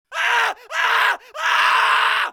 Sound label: transformation, zombie; lin gang